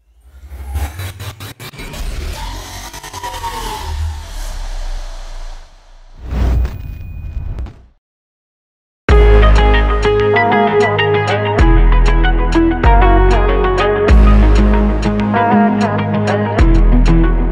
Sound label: rock inspired rap beat hard motivational